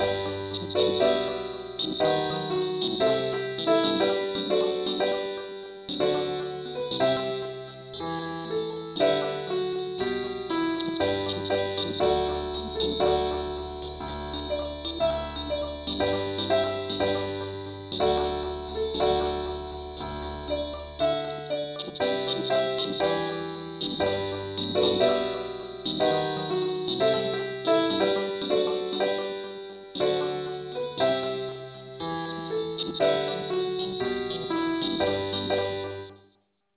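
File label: ukulele no vocal key G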